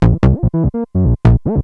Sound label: ring